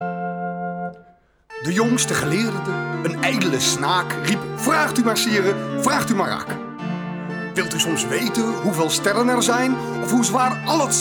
Klein orkest

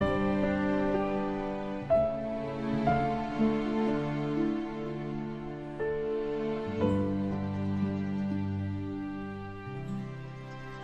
klassiek

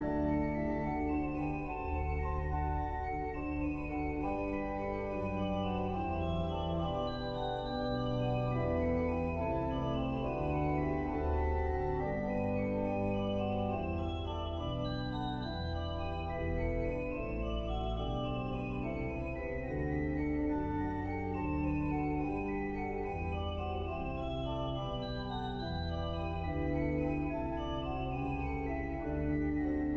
orgel

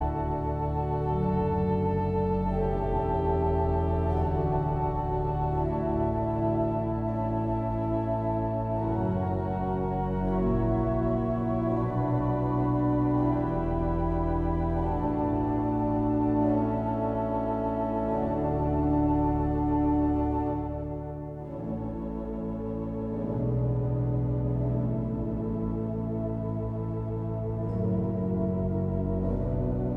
orgel

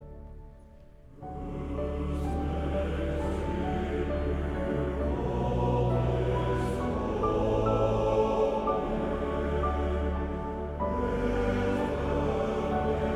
Koor (mannen)

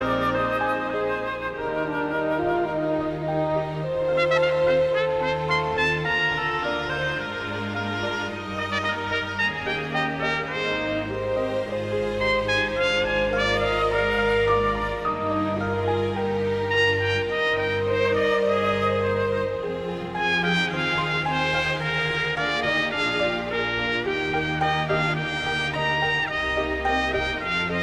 instrumentaal

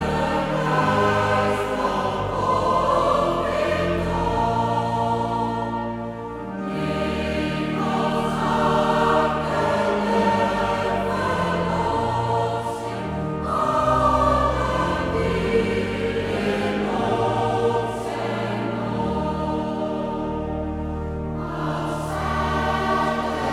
koor

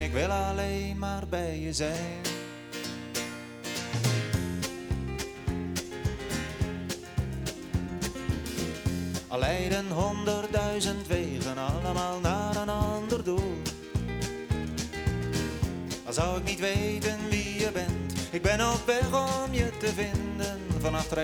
Applaus

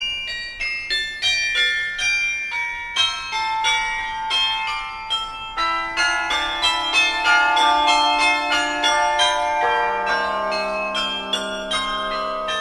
Carillonmuziek; Carillionmuziek

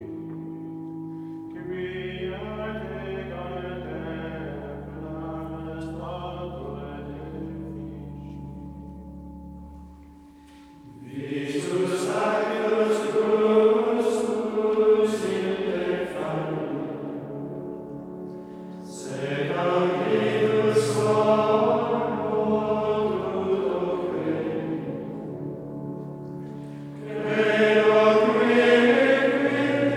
Koor mannen Gregoriaans; Adoro te

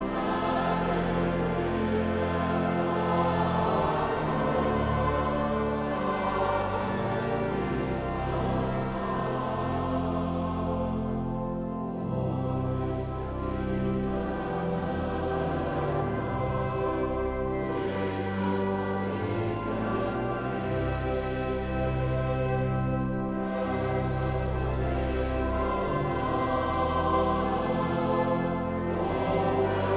Koor

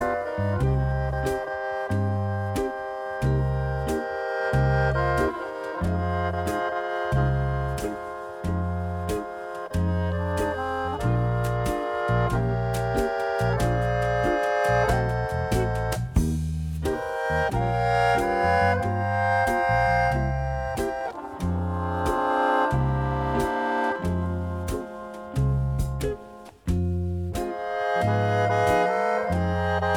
harmonica

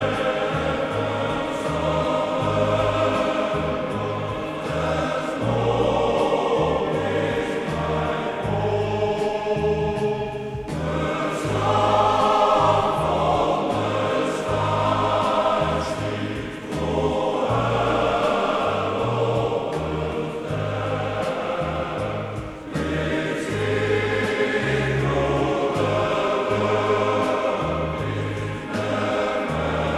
Koor (mannen); Westlands

